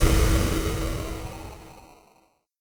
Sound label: spell freeze; Crypt of the NecroDancer